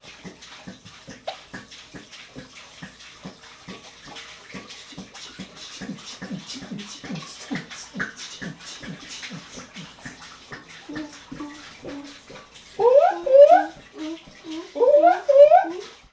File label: carboy pour